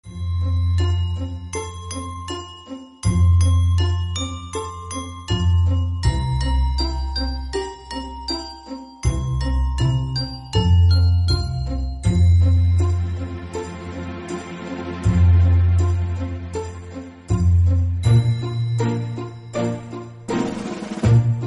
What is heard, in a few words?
instrumental; rain rain go away